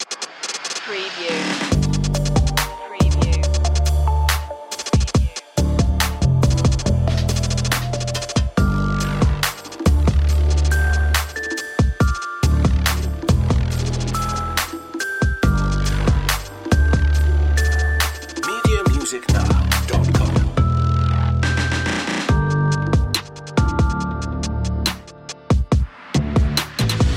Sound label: dark shadows